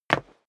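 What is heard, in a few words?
step dress boots wood parquet single stair down, footsteps; schritte; menschen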